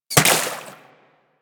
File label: Bullet Impact Water; Warframe; DE; Weapons